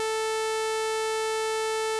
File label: sawlike